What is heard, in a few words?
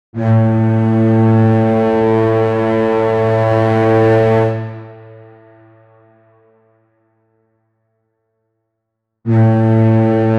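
menacing medieval war horn, brass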